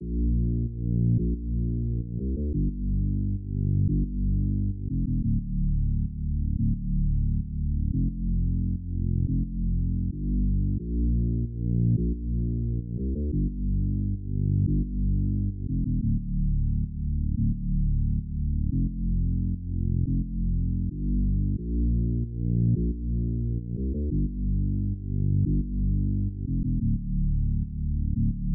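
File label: 89 BPM D Minor Bass, Latin; Melodies; Loop Stems; Blanco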